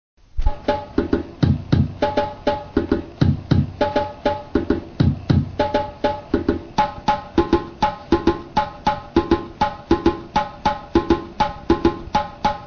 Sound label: drum rhythms